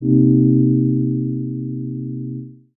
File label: Mio Mix Baby Bass